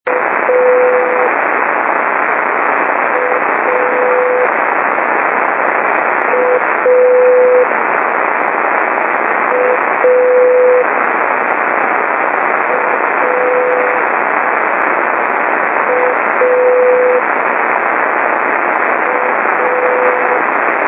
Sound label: beacon 4558 KHz